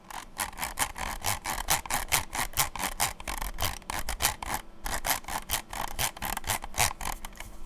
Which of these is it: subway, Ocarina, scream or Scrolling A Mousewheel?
Scrolling A Mousewheel